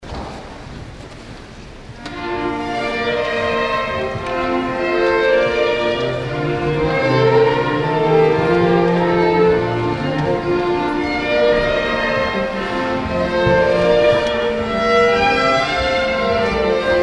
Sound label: Gavotte